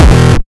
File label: frenchcore B, kick